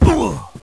fall hit, player male

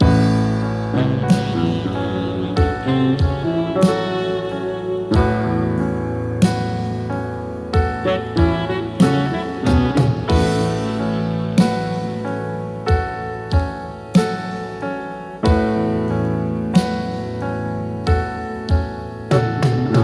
dawn piano; night; garns; desolation

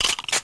pick weapon

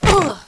fall hit, player male

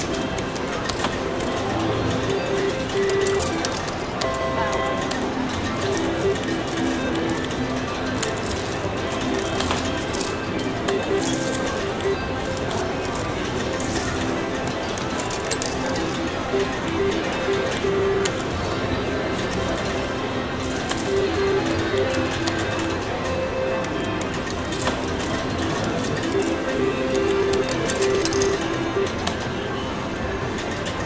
casino

